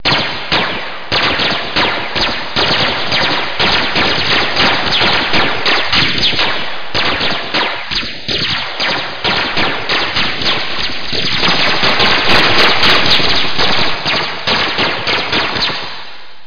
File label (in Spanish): disparos